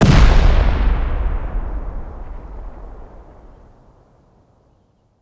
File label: c4 explosion; Rust